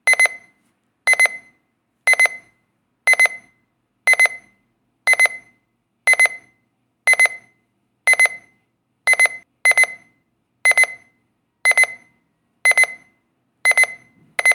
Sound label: sample of the alarm sound